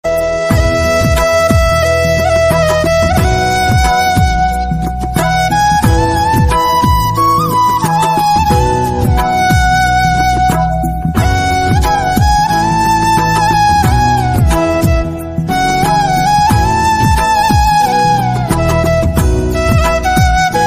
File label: flute ringtone devotional ringtone